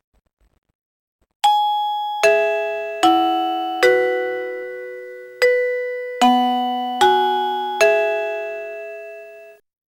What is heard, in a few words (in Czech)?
melodie